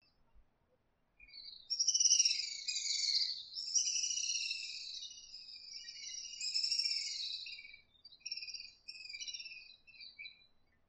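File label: house swift call; himeamatsubame nakigoe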